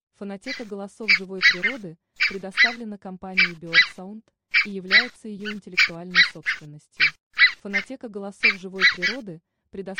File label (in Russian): Казарка кр зобая; oca collorosso